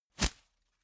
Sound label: whoosh paper scrap flutter hard; swooshes-swishes